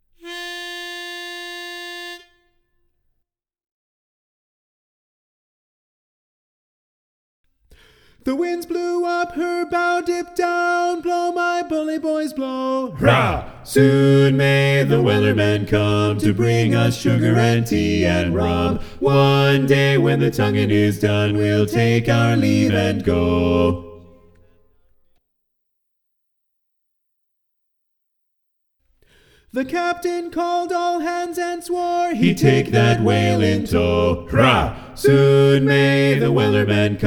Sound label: Sea Shanty Medley TTBB - Lead Muted; abridged; west region spring sing